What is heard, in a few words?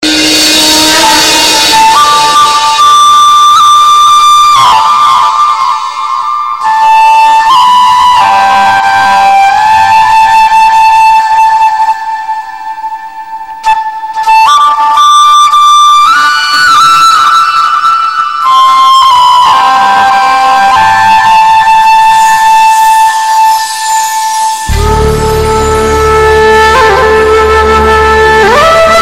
krishna flute, ringtone